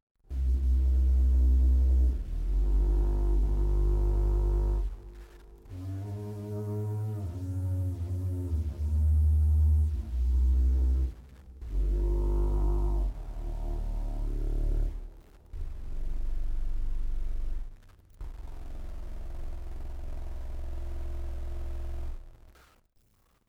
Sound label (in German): Rohr Solo, Becher; 7te Rohr HfK Solo